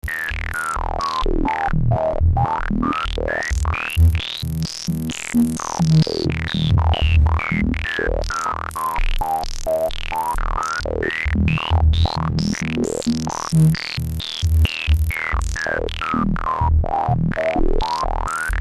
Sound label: band pass sweeps